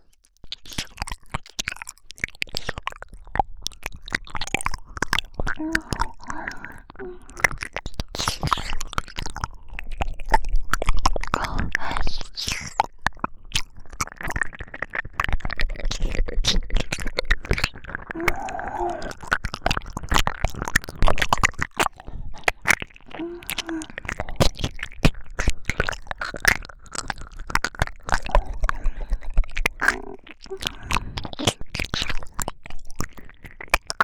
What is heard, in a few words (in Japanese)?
二人で耳舐め